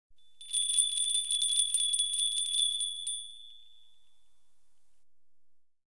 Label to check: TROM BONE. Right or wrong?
Wrong